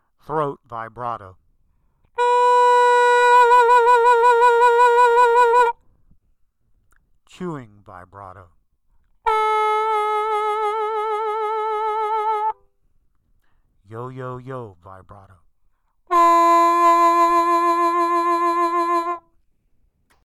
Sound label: Vibrato